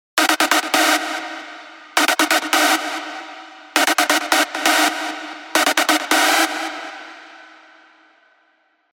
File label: Synthetic Rage, rave stabs; revealed; Spire